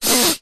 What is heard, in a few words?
human sniff hard blocked nose; zapsplat